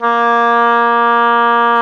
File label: OBOE, mf f; Roland L-CDX-03 Disk 1; Oboe 2&3